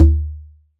LOW TUMBA, Conga Battery; E-MU Formula 4000 Series Vol. 4; Earth Tones

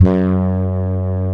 HR G2, ANA HRN

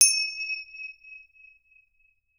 SPINNIN, BELLS CHIMES; Voice Crystal - Steve Reid Definitive Percussion

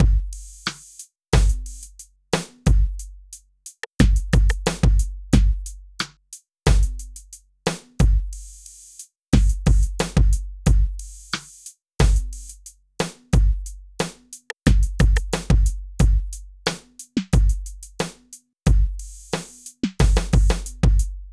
Beat, Hip-Hop

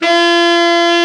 TENOR, Tenor Sax; Tenor Sax 1

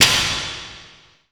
snap, percussive

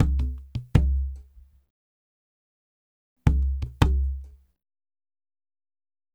ICE WD1, Liquid Grooves; Spectrasonics

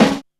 THIK GATE, SNARES; Back In Time Records; Big Bang

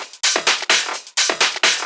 Discohouse Loop 128 BPM

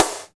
SNR, Tekno Industrial; East West

